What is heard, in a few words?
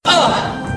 proxy hurt; billy herrington; slender